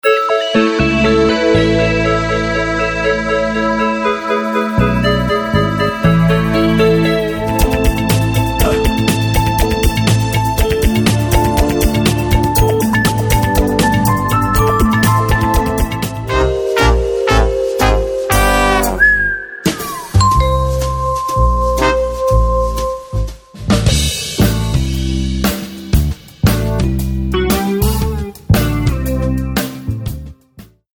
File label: Jazz Comtemp